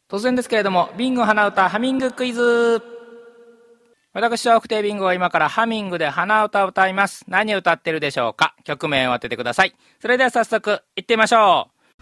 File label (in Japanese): hanauta